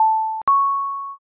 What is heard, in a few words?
elevator